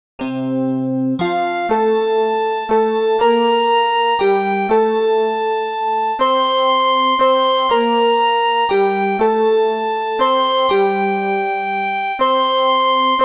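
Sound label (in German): hosianna keyboard; advent weihnachten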